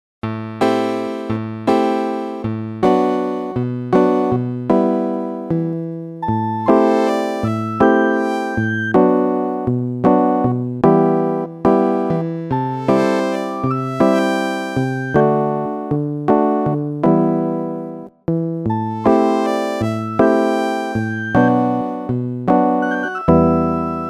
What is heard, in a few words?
piano harpsi; gombulator